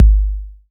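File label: bass drum 808; Best Service Gigapack 2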